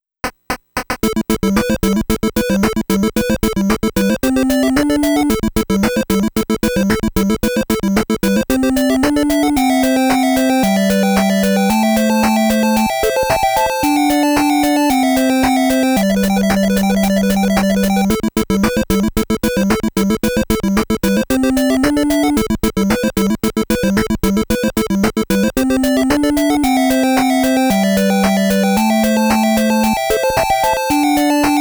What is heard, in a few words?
Stage BGM; Ninja JaJaMaru Collection